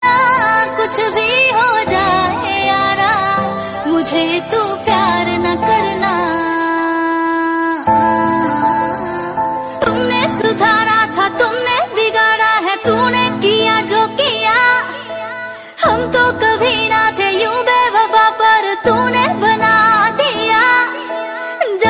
female version

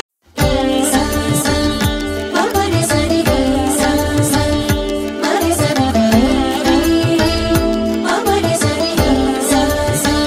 BGM Ringtone

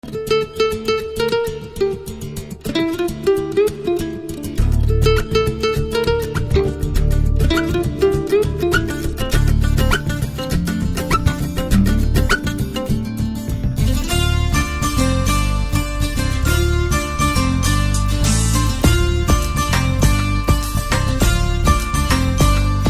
BGM Tone; Athadu

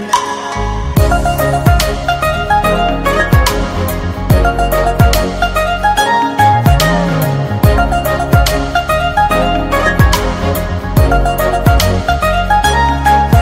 Song Instrumental Tone

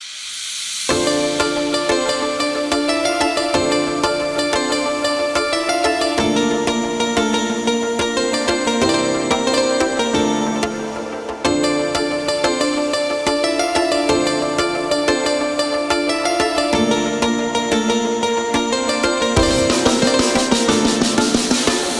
Song BGM Instrumental Tones

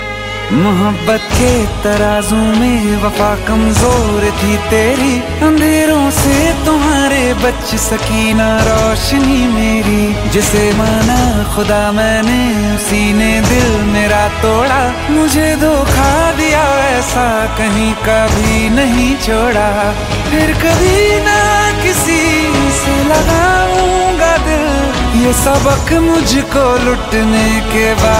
Song Tone

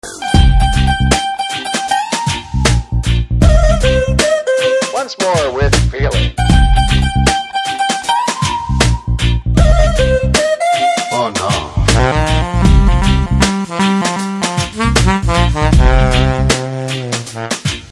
BGM; Attarintiki Daredi

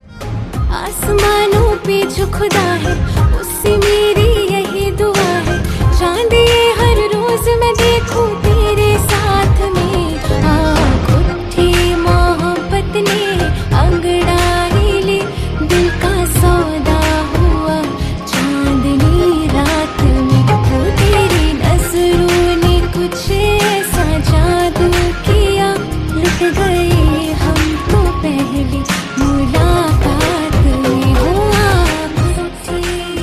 Female Version Song

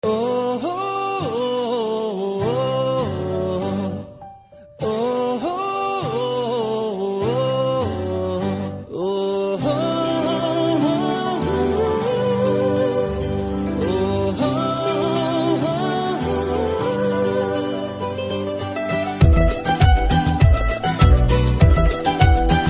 BGM Ringtone

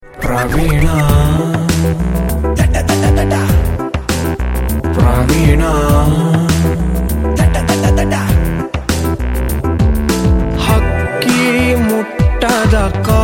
Kannada Ringtone

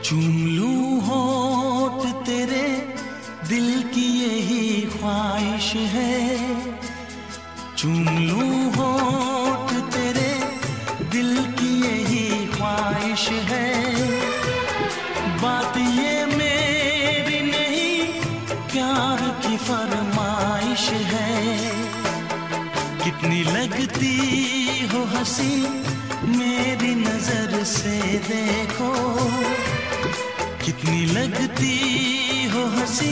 Song Ringtone

